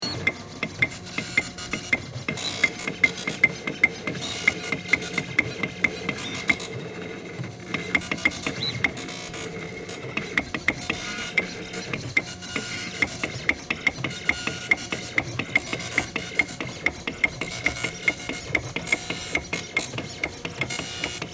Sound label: Composition For Plotter Printer